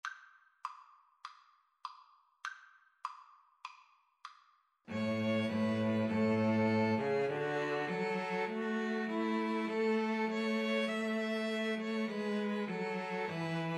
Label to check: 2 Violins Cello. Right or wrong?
Right